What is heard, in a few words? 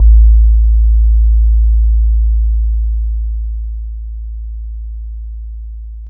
A3 rap bass; Giga Samples Collection